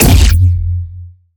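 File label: Sci weapon Shot Medium Blaster Power Charger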